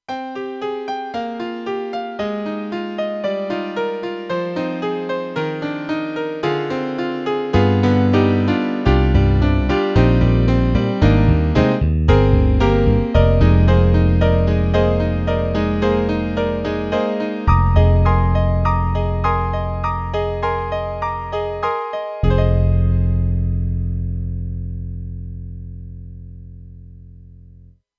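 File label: Bass Piano + Brass; Roland JV-2080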